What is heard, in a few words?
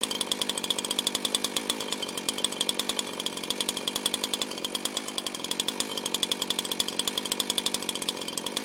Saw Idle